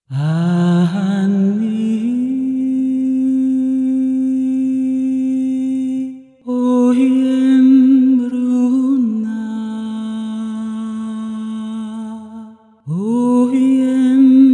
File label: Lyrical Vocal Phrases Alt